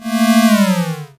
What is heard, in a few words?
Car pass-synth; puchicon; dsi ds